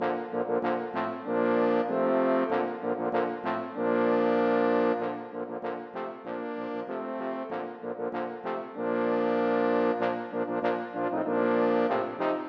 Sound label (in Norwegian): For fire baritoner